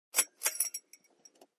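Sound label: Chain Pull Light Switch; switches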